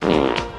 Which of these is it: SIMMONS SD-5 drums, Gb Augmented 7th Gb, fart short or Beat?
fart short